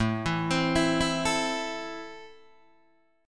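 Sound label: A Minor, Guitar DADGAD, chord strum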